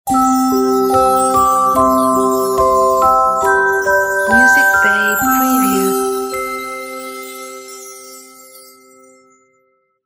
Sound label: Christmas Quiet Logo